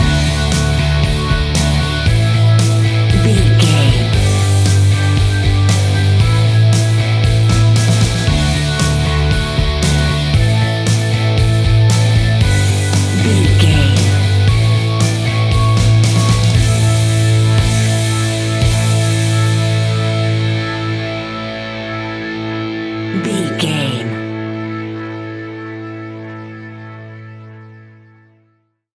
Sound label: old classic rock music; Old Classic Rock 15 Sec Mix; Catch 22 Music Network